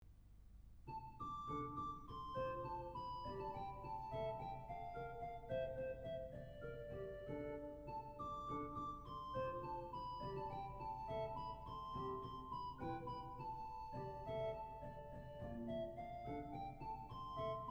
organist; Douglas Bell; snetzler

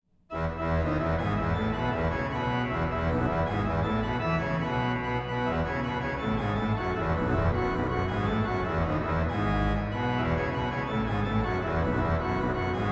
pedal, organist; in dulci

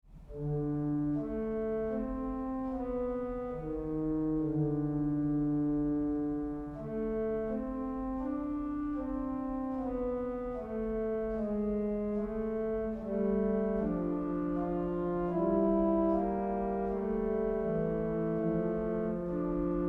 fughette, organist; Andreas Willscher

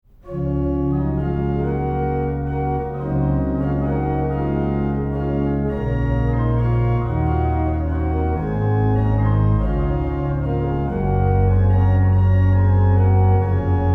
vorspiel d, organist

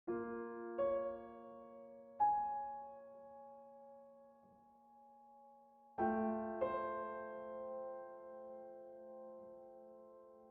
arpeggio, contemporary piano repertoire; skempton; organist online recordings

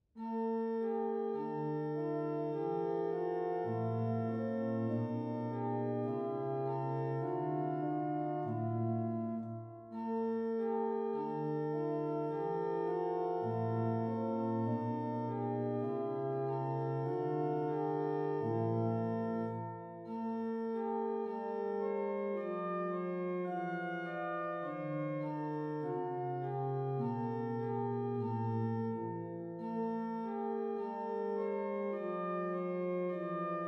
aeolisch, organist; Lothar Graap

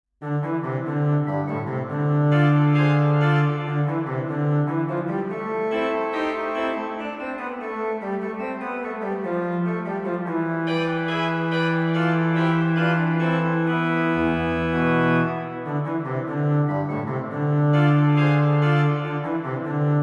postludium, organist; velten; organist online recordings

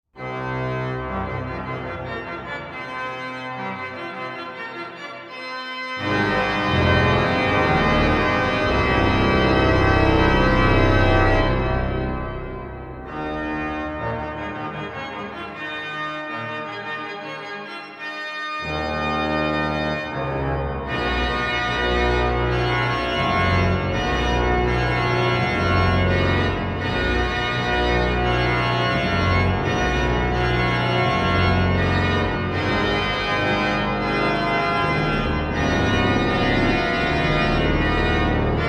fanfare resurrection, organist

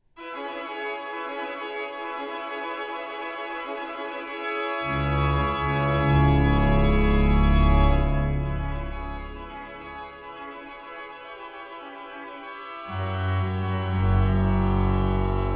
fanfare, organist

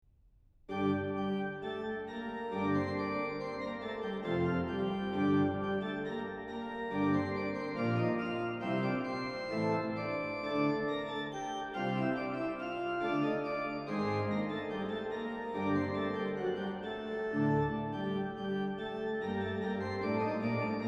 organist; Douglas Bell

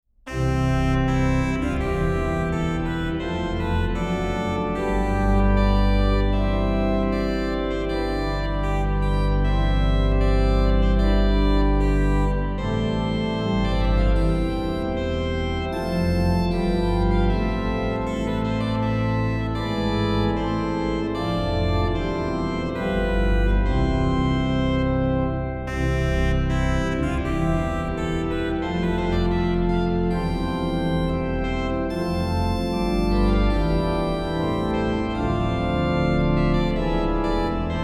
trumpet tune in C major, organ

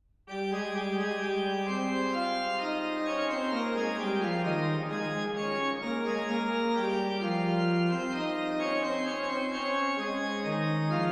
fugue, organ; David C. Bugli; Fugue(?) for Organ (1968)

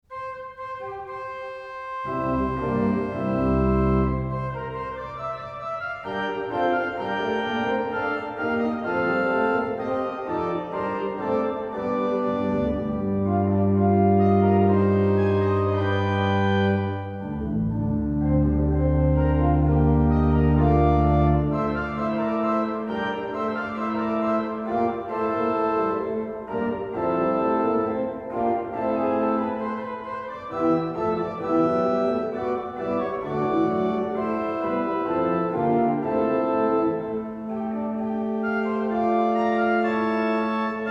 vorspiel, organist

